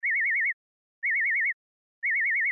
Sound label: warble 2000 70